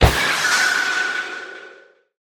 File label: Start Magic; portal quest android; Necromancer Skill1